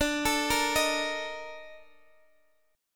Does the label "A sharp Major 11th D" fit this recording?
Yes